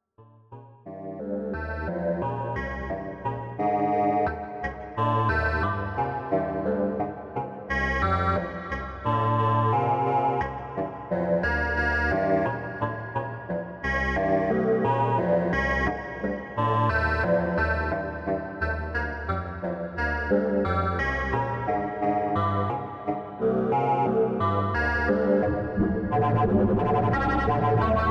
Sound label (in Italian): musica da camera